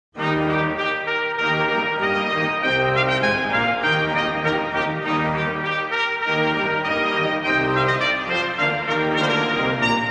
March in F Major (Bellicoso); Musique héroïque, TWV 50 March No. 5

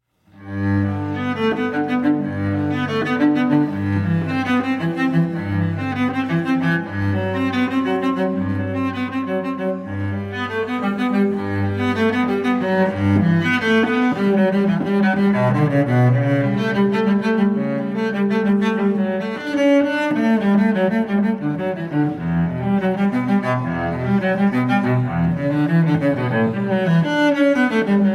Cello Suite No. 1 in G Major, Prélude; BWV 1007 I.

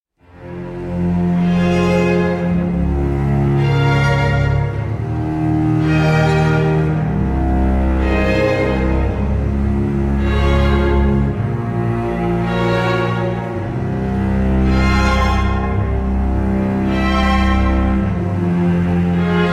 Lento Pizzicato; Lento Nobile

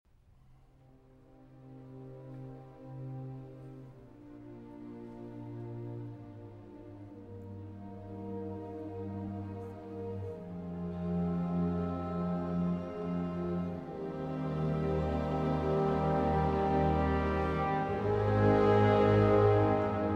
Piano Concerto No. 2 in C Minor, Adagio Sostenuto; Live in Australia, 2003

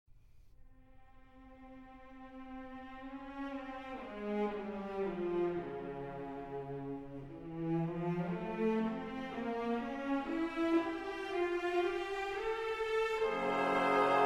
Viola Concerto in C Minor Andante cantabile

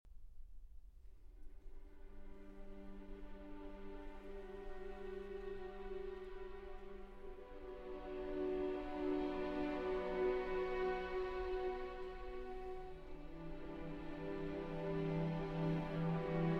Radiophilharmonie - Choral

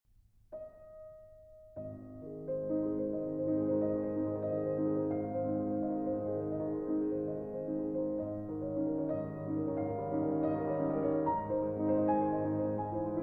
Etudes in A-Flat Major Allegro sostenuto; Op. 25 No. 1; 'Aeolian Harp'

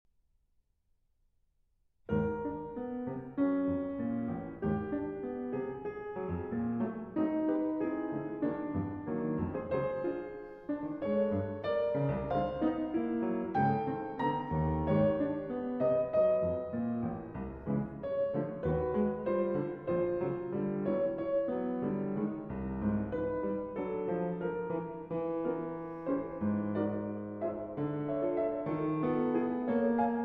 Piano Suite, Andantino. Con grazia

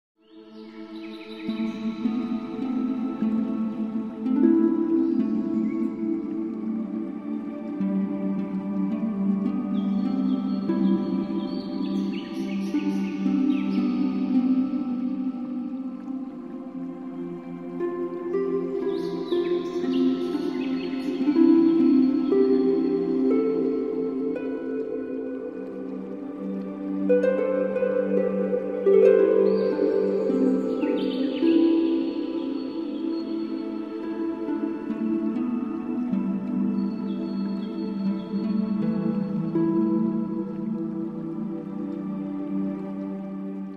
Celtic Meditation Music, 432hz; Enchanting; Hypnotically Charming 432hz Frequency

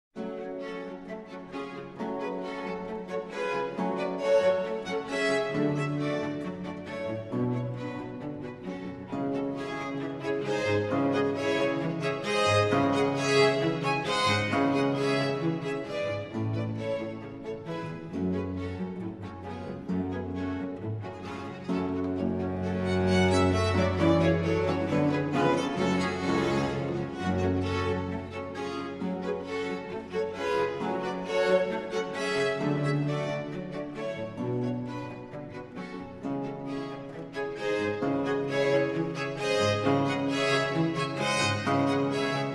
Orchestra Prelude; The Fairy Queen, Z. 629 Act 5

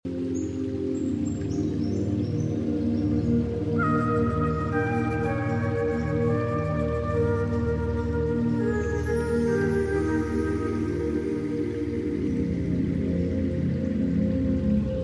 Celtic Spring ASMR Soundscape Ambience; Cozy; Loopable

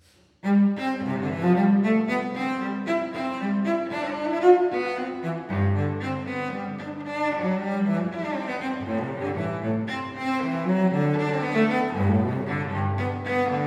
Suite in C Major, Gigue; Suite No. 3; BWV 1009

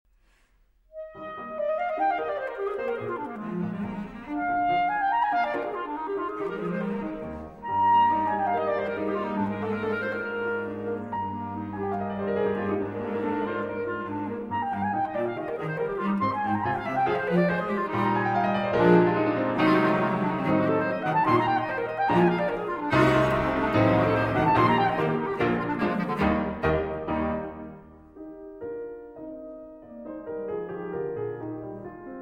Clarinet Trio in E-Flat Major; IV. Finale; Op. 44; Farrenc

